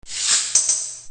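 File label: shum, noise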